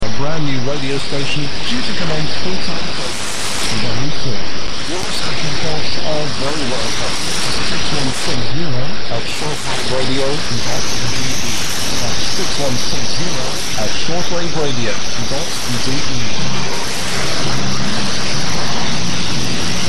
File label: 6160 kHz